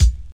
smooth kick a sharp key, Royality free kickdrum tuned to the A# note. Loudest frequency: 583Hz